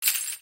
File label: Tribal fold open; Nokia; Audio themes